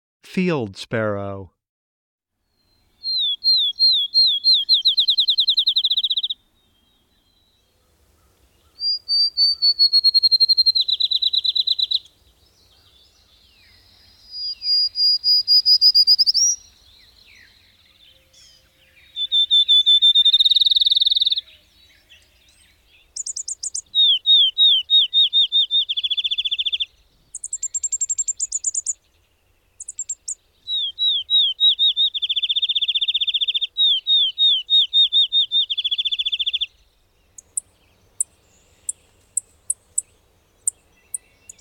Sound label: field sparrow